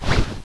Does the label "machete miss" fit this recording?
Yes